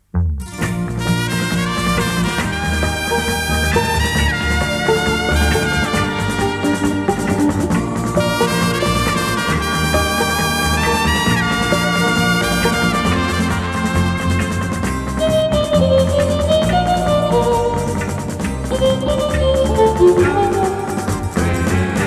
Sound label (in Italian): galoppata tromba e chitarra